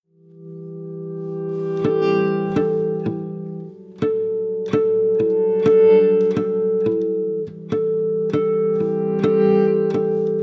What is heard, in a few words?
Acoustic Remix